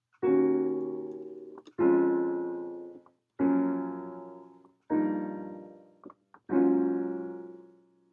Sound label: 2 5 progression